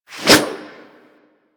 Axe Small Swing; Warframe; Weapons

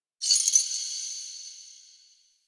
Fortress Chains Small; Warframe; Grineer Fortress

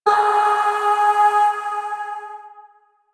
Shawzin Oct Two G Sharp; Warframe; Zariman

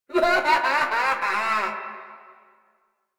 Laugh, Grineer Queens Guards; Warframe

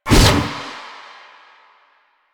Stalker Scythe Spin Whoosh; Weapons; Warframe

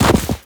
Run Deep Snow, Footsteps; Warframe; Operator

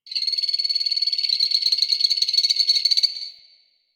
Night Poison Frog, Beach; Ambience; Warframe; Grineer Beach

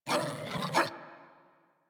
Puppy Idle Growl Playful; Enemies; Vocals; Kubrow; Warframe

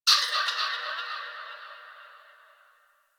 Forest Bird High; Warframe; Grineer Forest

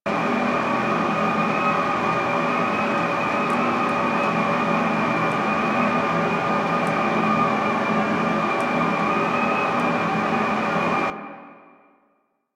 Air Vent Loop; Ambience; Props; Warframe; NineteenNinetyNine

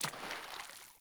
Sandals Run Water, Footsteps; Characters; Player

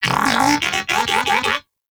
Moa Pet Enemy Spotted; Warframe; Tenacious

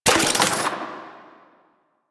Mech, Spear; Warframe; Weapons; Tenno; Priest; Scourge Prime

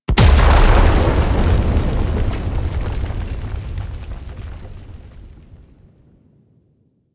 Wyrm Debris Impact; Enemies; Warframe; Infested; Microplanet Sun Monster

